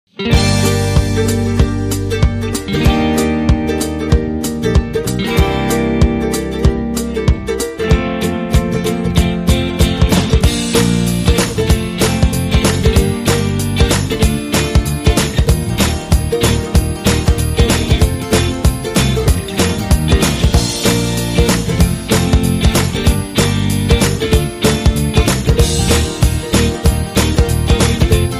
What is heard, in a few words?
Sea Sick Tuning, Rhythm